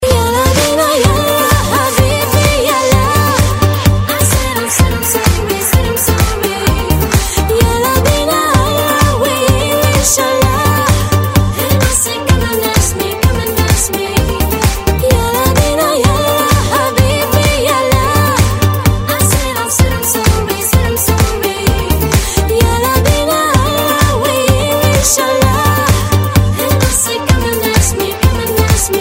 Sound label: arabic ringtone